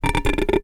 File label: Finger Rub, Large Wine Glass